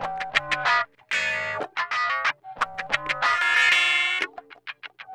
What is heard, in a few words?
FUNKULATOR, Bizarre Guitar, WEIRD WAH; Spectrasonics